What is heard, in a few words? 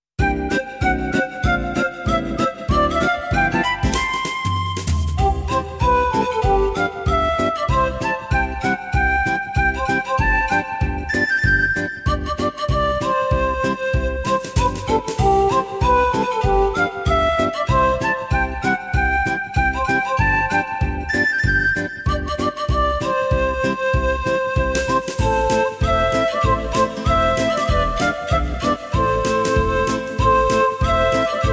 swing